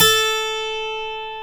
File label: string; AKAI S-Series CD-ROM Sound Library VOL-2; 12STR.G